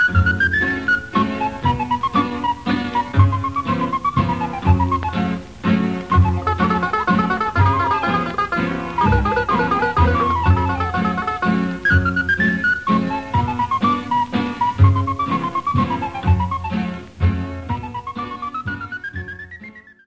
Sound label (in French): Mazurka, Pays-Bas; Graziella Mazurka